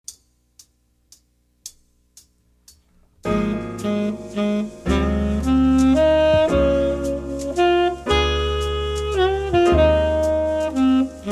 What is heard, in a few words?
Waltz, trumpet, jazz; 15 Easy Jazz Etudes; CM Level 3 Solos (jazz)